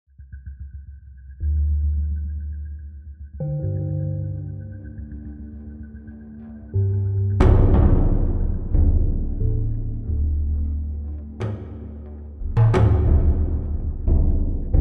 Summoned; Vanishing Realms; VR; Windows

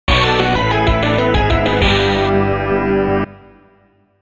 Unknown Fanfare; hot wheels burnin rubber gba

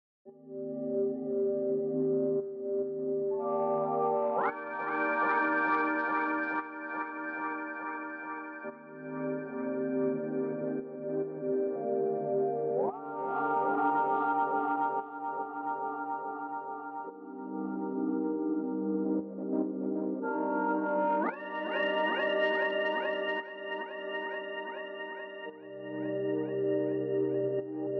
Synths II & Pads

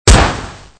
smg; Gun Mayhem 2

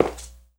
walk tile

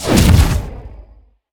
Cannon Explosion; helltaker; macos; windows

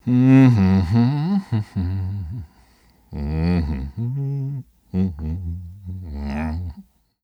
Voices male humming

